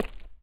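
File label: gritty cement run, footsteps; chief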